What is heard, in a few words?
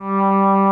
PA, ANA PAD